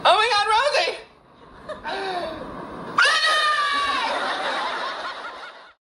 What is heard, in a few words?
Scream